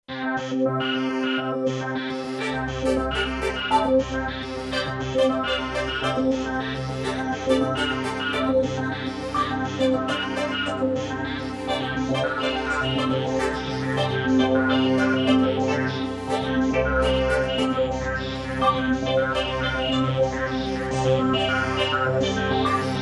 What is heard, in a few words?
Fast Pulse Technical News